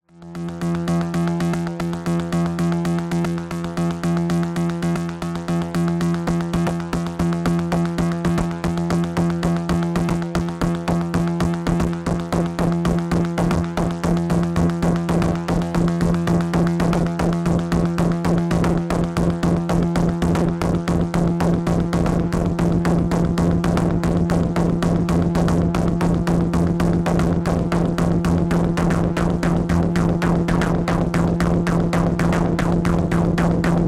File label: grotesque metal dark distorted tekk noise jam; analog rytm